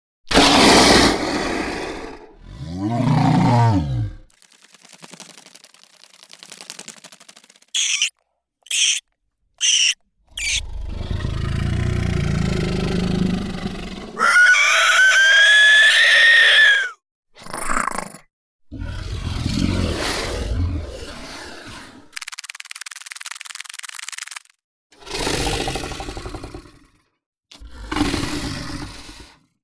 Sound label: creatures designed sound fx